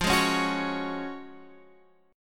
Gb Major 7th Suspended 2nd F, chord

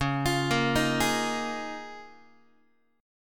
Db 6th Add 9th Db down Guitar; Db6add9 arpeggiated; JGuitar

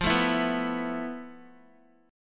F Major 7th Flat 5th, F, down, Guitar; strummed; JGuitar

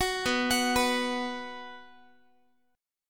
B 5th B down Guitar; arpeggiated; 21,19,21,21,x,x; JGuitar

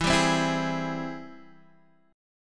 G sharp Augmented Major 7th E

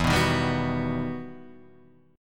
E Minor Major 9th E

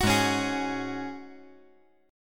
Ab 7th Suspended 4th Ab down; Ab7sus4 strummed

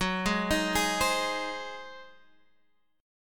Ab 7th Gb

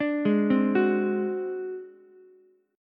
D 7th Flat 5th D down Ukulele; D7b5 arpeggiated; JGuitar

